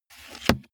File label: car bmw e46 interior sun visor mirror slide